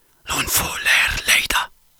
Battle Cry, Viking Vocal, Un-Processed; Battle Cries & Phrases; Ghosthack; SkyRealm; Viking Vocal Builder

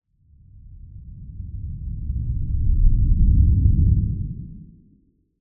Footpace Speed, Whooshes

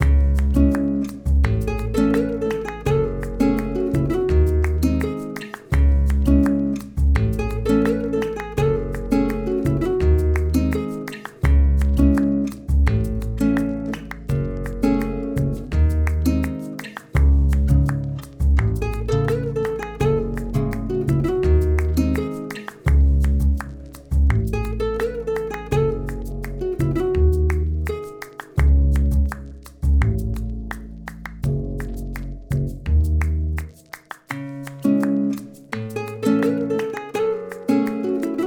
84 BPM C# Min, World; Melodies; Sweet

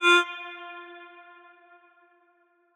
Wet One Shot - F, Vocal; Tonal One Shots; Cymatics; Euphoria Vocal Sample Pack

